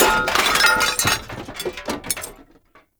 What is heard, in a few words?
Desktop Glass Metal Destroy; Warframe; Entrati